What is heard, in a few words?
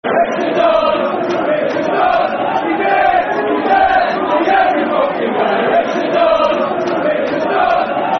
Football Chants (Soccer Songs); Richard Dunne; Man City Mcfc